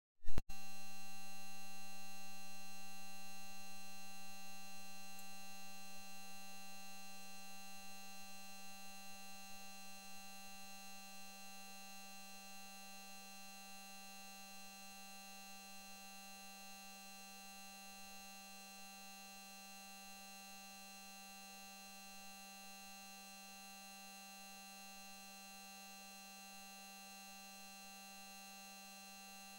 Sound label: mic; mars2020; edlcam; postCME health concat full